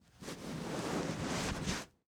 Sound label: Cloth Blanket Adjust; household